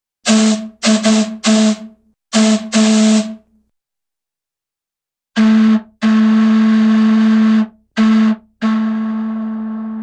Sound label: Factory Steam Whistle Ship Steam Horn - Gasing, Cartoon; Hollywood Edge; Cartoon Trax